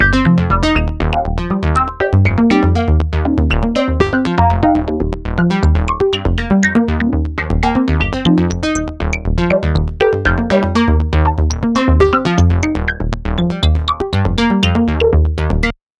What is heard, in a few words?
MODULAR LOOP 120 BPM; ENGELHARDT